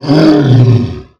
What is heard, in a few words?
wolf cry; wolfteam